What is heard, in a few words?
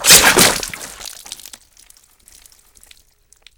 chop, Gore; Civ